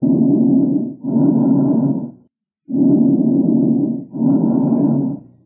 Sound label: Clear Lung sounds; medic kat